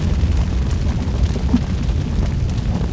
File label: lava